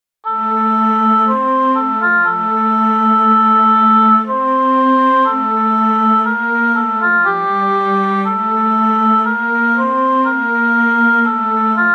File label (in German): du mein gott hiob 33 28 keyboard